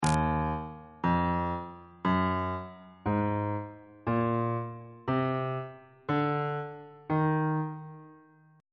e flat melodic minor scale note positions on bass clef